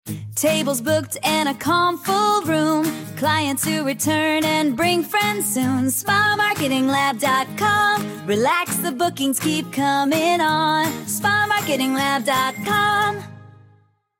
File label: jingle, podcast